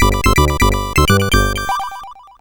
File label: Break 64, sid CSG8580R5